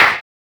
Clap, Percussion; Sample Banks

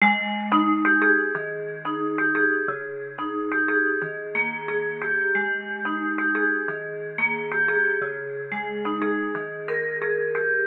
Pluck Happy G# 90; Melody; Keyboards

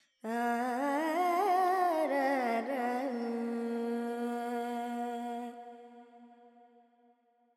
FX Dorian, Vocals, Carnatic Female, Alaps, 70 G

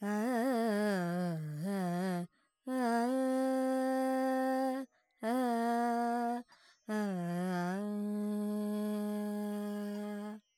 Pentatonic, Vocals, Carnatic Female, Alaps, Dry; Sample Banks

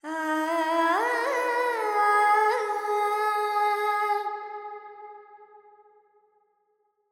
Verb Phrygian, Vocals, Carnatic Female, Alaps

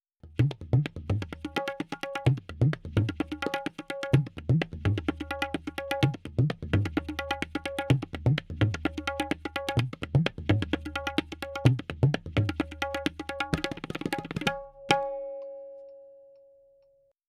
Tabla C 4-4 128, Percussion; Sample Banks

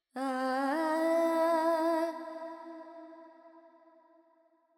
FX, Vocals, Carnatic Female, Alaps, Charukesi

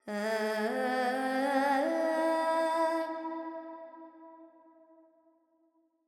Carnatic Female Alaps, Panthuvarali, 100 G, Verb; Sample Banks

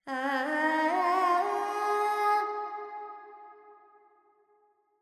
Pentatonic, Vocals Carnatic Female Alaps 70 G; Sample Banks; Verb

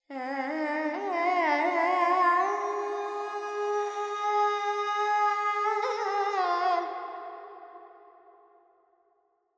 Carnatic Female Alaps, Purvi Kalyani, 100 G, Verb

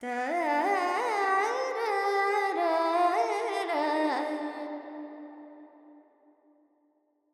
Verb, Kapi, Vocals, Carnatic Female, Alaps, 128 C; Sample Banks

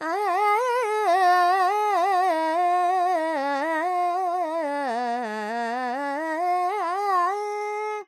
Dorian, Vocals Carnatic Female Alaps 70 G Dry

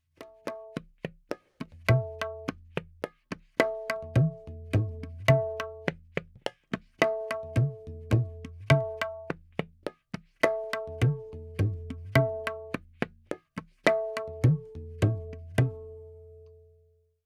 Tabla, 4-4, 70; Percussion

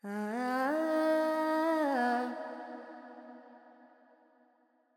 FX Phrygian, Carnatic Female Alaps; Sample Banks

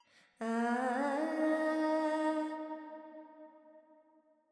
Verb Dorian, Vocals, Carnatic Female, Alaps, 70 G

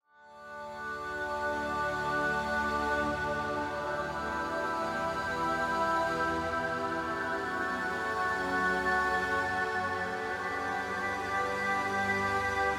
Pads Dreamy F#m 150; Melody; Keyboards

